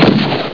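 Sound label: shotgun; Pamela Anderson Interactive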